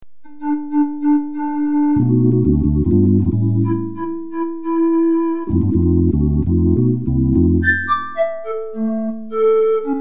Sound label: keyboard